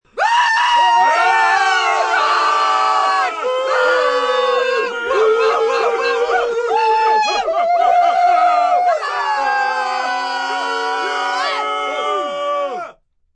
Group Cheer Loud, Cheering, yelling, group, quasi war cry (woo woo) (5-10 people) (human voice ); Crowds; Voice Box